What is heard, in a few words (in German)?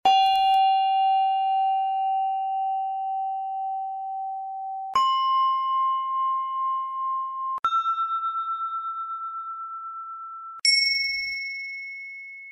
glocken